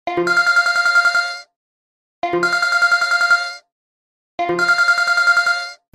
noot noot samsung, ringetone

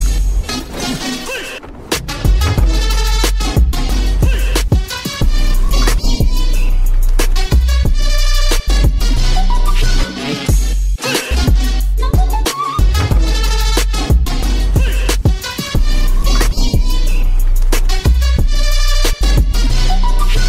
iphone 12 ad music

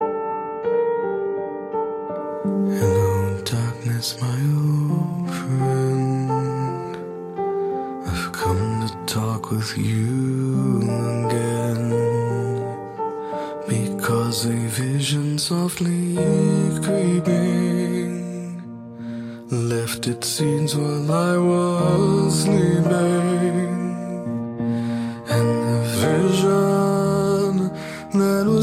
Samsung, ringetone

